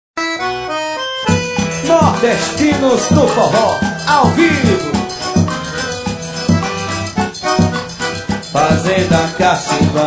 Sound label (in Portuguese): forro